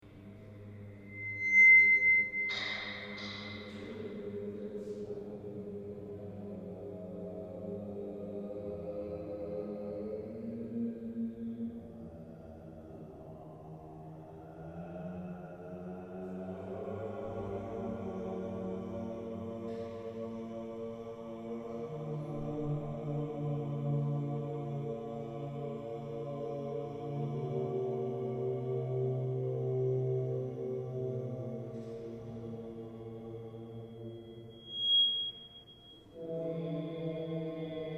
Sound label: Whistle Jam, Choral Chanting; excerpt